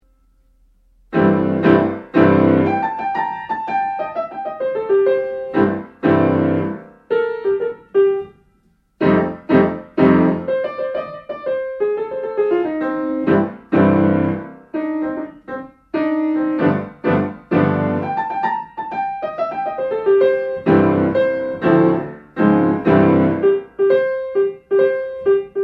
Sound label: trumpet; New Orleans Jazz Styles